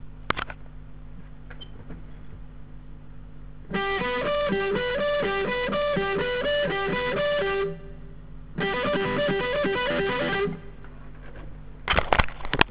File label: alternate picking